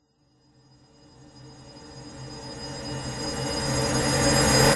sound design riser airy high frequency ringing tension eerie suspense drama